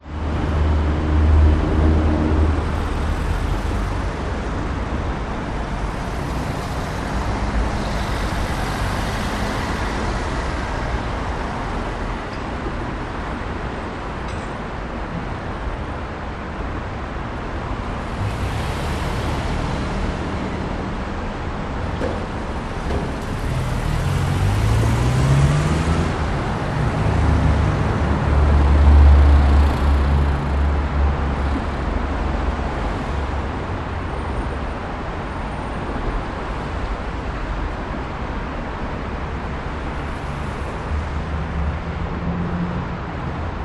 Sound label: brisbane cbd traffic slip road truck reversing tone midday; m3; gardens point road